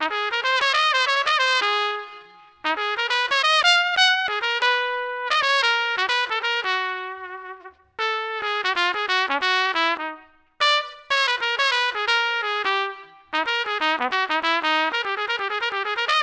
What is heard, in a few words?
trumpet quite good; Audio Analogies